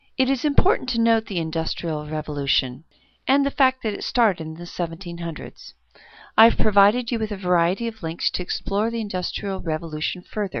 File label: industrial